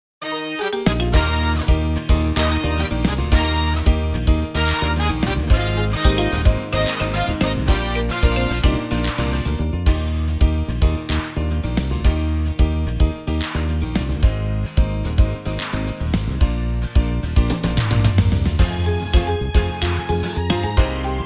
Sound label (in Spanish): Karaoke